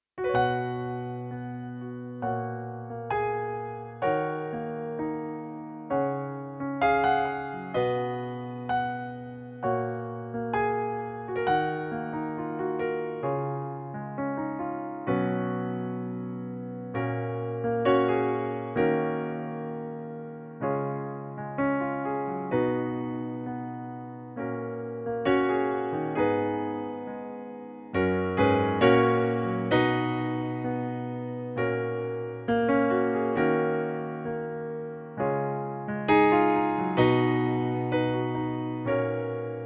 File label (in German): Pianoplayback F#-Dur